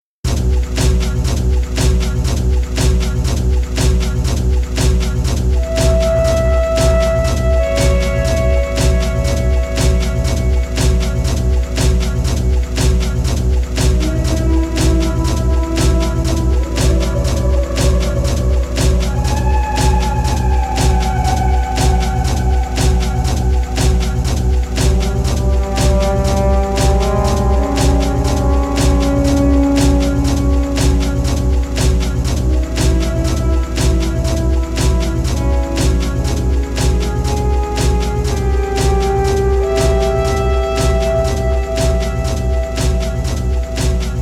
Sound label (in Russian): Шаги (инструментал)